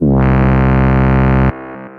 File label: Bass, Retro Synths; CZ PlastiqBass; Ableton; Phazeform Vol 1